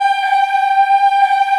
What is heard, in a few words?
G4 child, Choir; CHILD IHF